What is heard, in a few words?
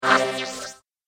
Trailer Accent; Trailer Sound Effects; sound design